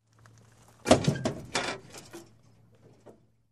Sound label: Car Bump Sound